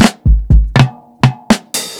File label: 120 FILL, DRUMS LOOPS; BASEMENT DRUMS VOL 2